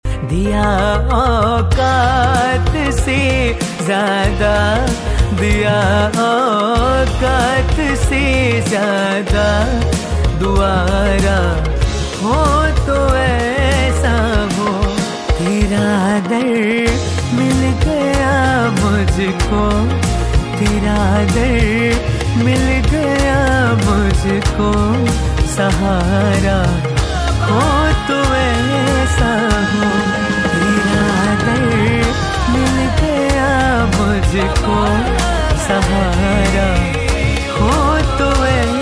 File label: Khatu Shyam Latest Bhajan Ringtone